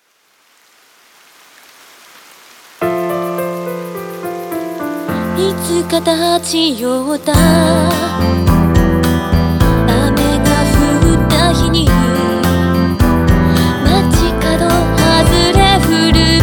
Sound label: Rainy days Cafe Acoustic Rain mix